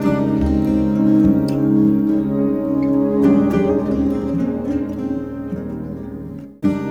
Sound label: Dark Settle, Pastoral; Sonic Foundry (Sony Creative Software) - Friendly Fire